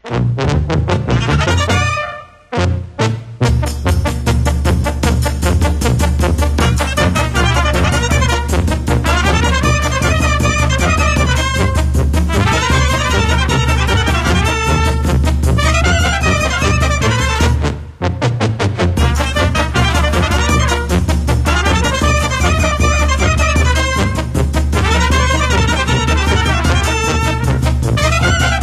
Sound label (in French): Fanfare